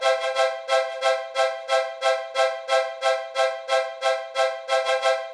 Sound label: 8th String, strings